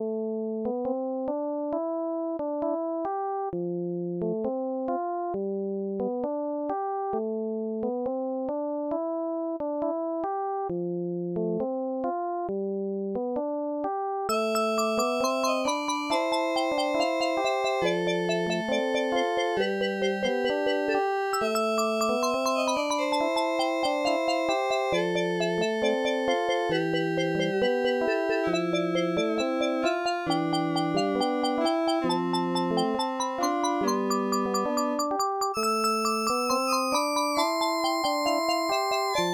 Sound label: Sound Blaster Version, shareware fanfare; Boppin'; Skeptical Jelly